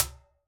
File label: Washing machine; Claustrofobia v1.1